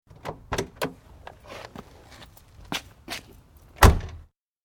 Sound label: Exterior - one person exits car, Peugeot 205GT; BBC